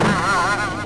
Boioing; Skunny

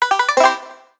Honeycomb Get; TheChaseBuzzer

